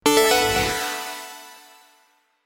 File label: Win